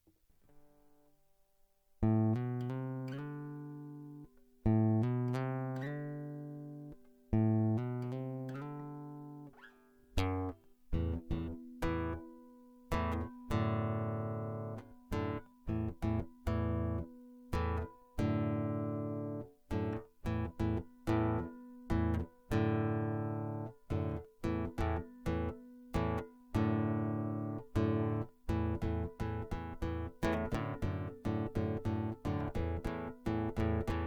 Guitar Loop

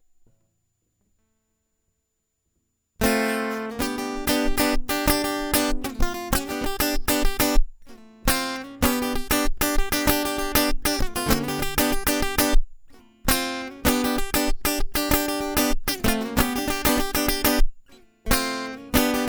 Guitar Loop

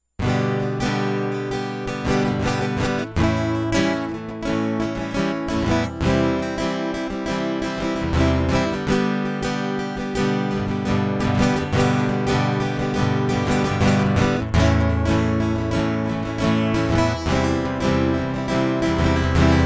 Guitar Loop

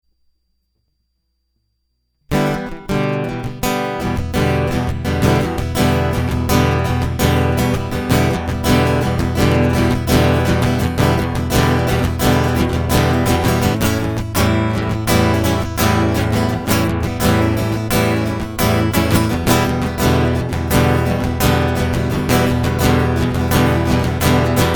Guitar Loop

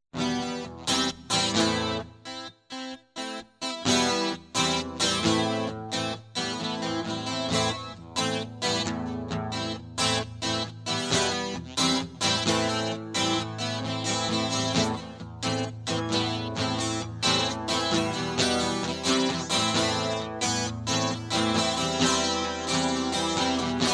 Guitar Loop

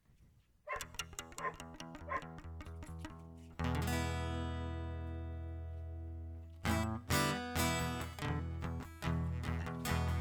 Blues; Dog Gone Blues Prelude

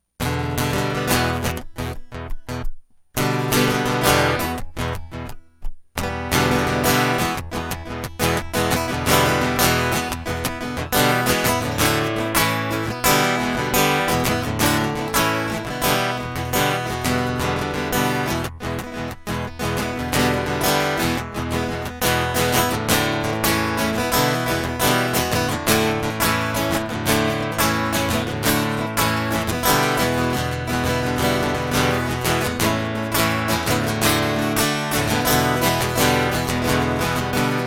Shake the Blues Guitar Loop

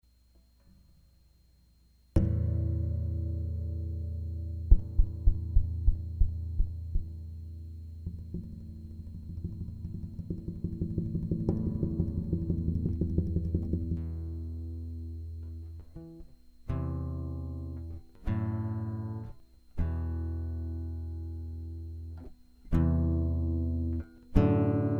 Guitar

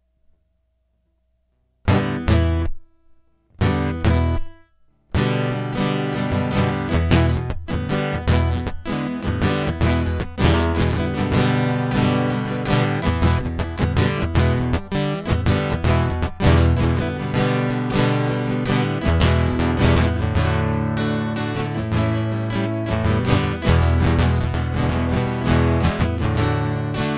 Guitar Loop

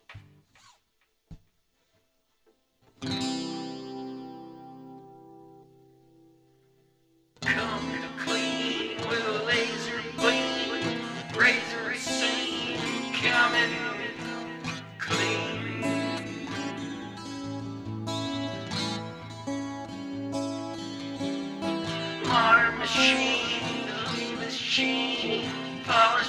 Laser Beam Clean Guitar Loop

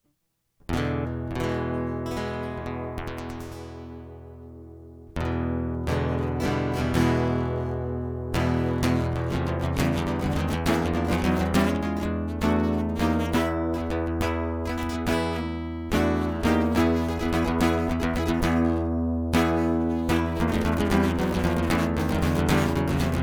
Guitar Interlude

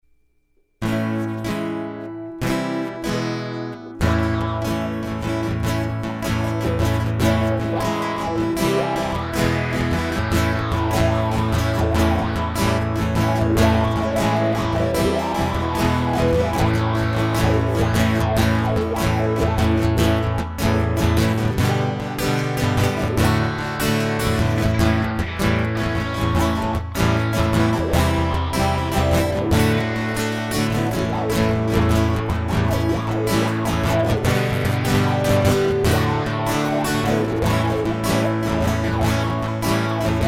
Interlude Electric Guitar

